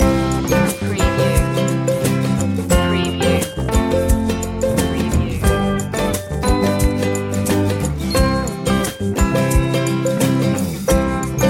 loop, Very happy and vibrant acoustic light pop track. Good mood; acoustic country folk